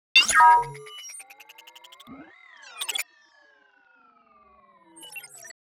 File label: fuel Loading